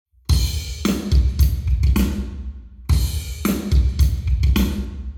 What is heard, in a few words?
Hall KEMAR Ear Drums 0to360deg